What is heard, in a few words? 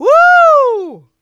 Vocals, Adlibs; Houseworx